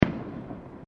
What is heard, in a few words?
Fireworks Single Firecracker